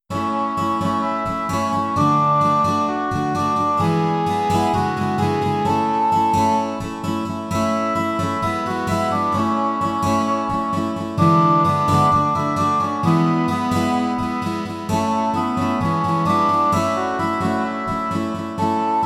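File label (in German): Lasst uns froh und munter sein, Gitarre A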